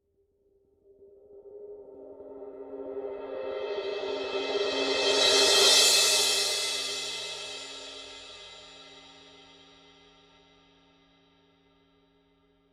signature 18 reflector full crash swell, cymbales; paiste

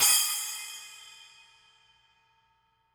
formula 602 ME 8 splash edge; modern essentials

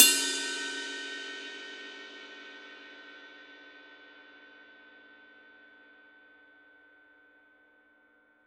formula 602 classic sounds 24 medium ride bell

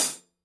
14 reflector heavy full hi-hat closed; signature

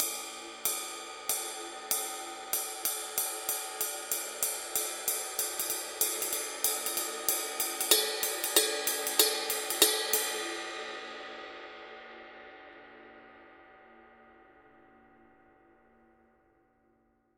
dark energy ride mark i pattern; cymbales; signature dark energy 20; paiste

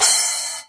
signature 10 splash choke

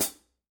formula 602 classic sounds 14 medium hi hat chick

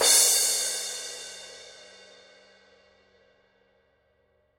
formula 602 classic sounds 16 paperthin edge

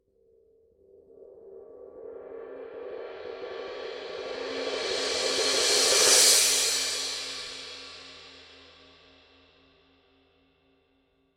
16 thin crash roll

